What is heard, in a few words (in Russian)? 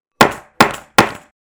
zvuk molotka